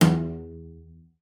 CELLO FINGER E2; Xsample VOL-5

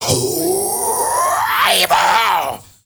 MALE RAVE, MALE NOISE, Heart of Africa; Spectrasonics; Heart of Africa 1

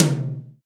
TOM, Dance

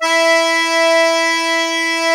DIATO1 E3, Historical Instruments; USB Soundscan vol.23

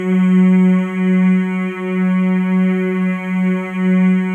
MALE MMS, Symphony of Voices; Spectrasonics

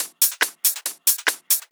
Loops 140 BPM Hihat Percussion Loops

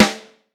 Vintage Snare Drum, 5.5X14; Q Up Arts; Johnny.C's Vintage Snare Drum

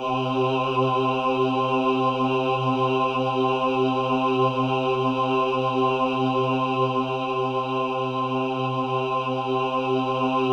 C3, Synth Leads

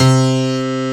PIANO ATK C3; Big Fish Audio; Synth City

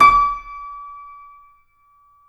C7 FF, The Ultimate Piano Collection; East West

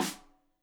Rim Shot Stereo; Deeply Sampled Drums; SMDrum

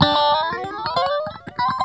GUITARS 130, House

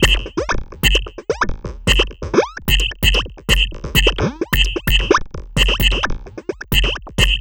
Drums Strange

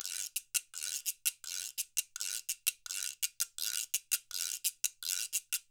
Percussion works loops; Sampleheads; NYC Percussionworks

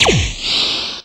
FX Dance

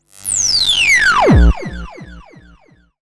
Virus Drop

